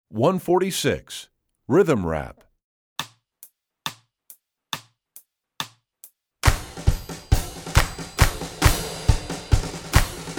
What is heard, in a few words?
Rhythm Rap, Strings; EE2000 Book2